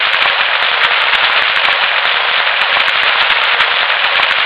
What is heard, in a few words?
Aircrafts Cockpits Atmospheric; DCS